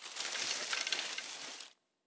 Bushes; We Are the Dwarves

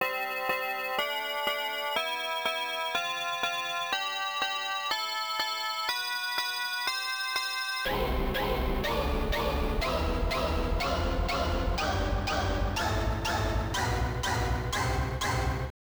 Speaker Check; Mystic Warriors: Wrath of the Ninjas; arcade

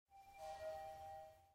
Click Button elevator

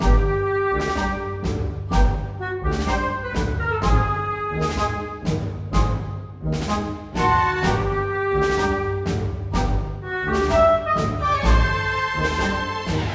March; Lady Unterwald; Loco Motive

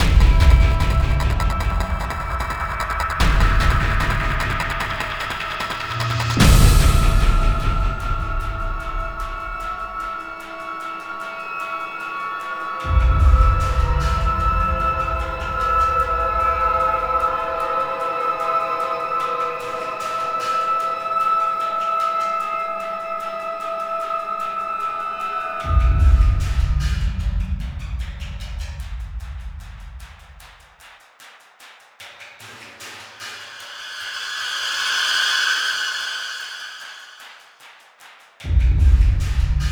Suspense; Prototype

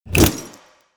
Ice Block; lego ninjago rise of the snakes

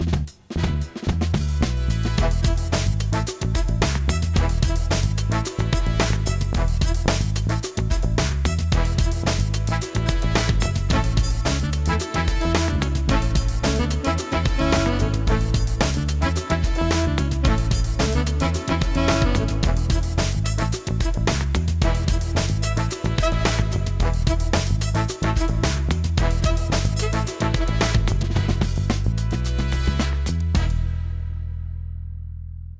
Funk, gamerip soundtrack; Backyard Hockey

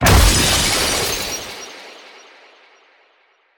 Menu Crack; A Dance of Fire and Ice

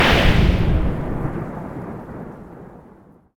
Lightning Strike; Idle Wizard

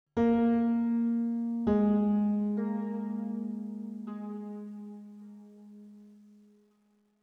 One shot Piano; zombie army 4 dead war ps4 stadia switch windows xbox one gamerip 2020

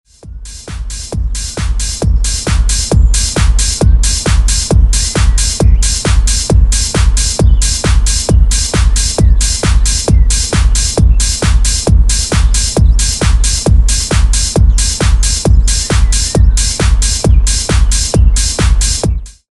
Radio Music; Dude Theft War; Android; Radio 1 Music Theme

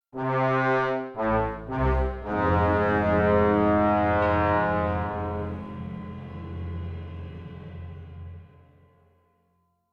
Music Battle Lose; Costume Quest 2

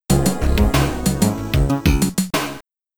Snowy Peaks Jingle

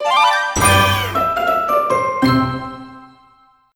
Crunchy Finish

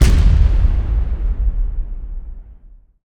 Weapon Artillery Medium Shoot

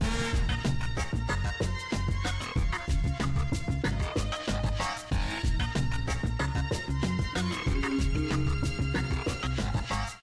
cafe music; Sabotain Break the Rules

